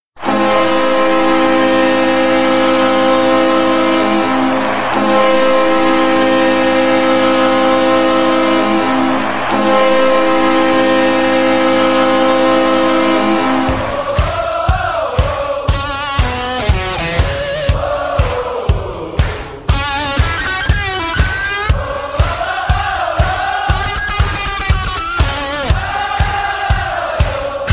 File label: Horn